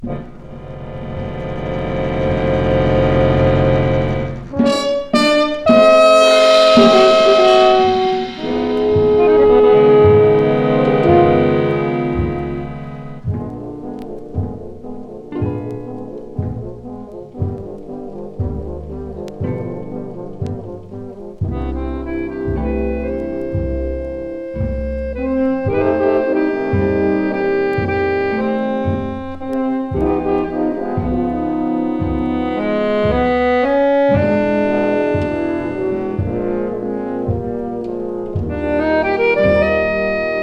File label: 4 french horns plus rhythm